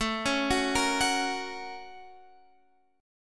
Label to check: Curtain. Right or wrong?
Wrong